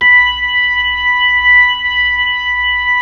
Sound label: B-3 Club Xfade, Keyboards of The 60's and 70's